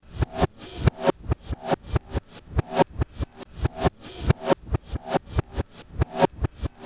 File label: Reverse Loop, SFX, Electronic; Sonic Foundry (Sony Creative Software); Electronic Point Blank